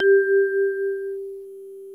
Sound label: FM vibes; Greytsounds; F2-SYNCLAV 2